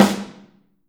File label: SN, Drums Percussion